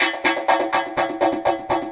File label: Echo Chuff, Synthetic Pulses